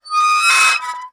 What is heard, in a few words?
Soldering Iron Stand Drag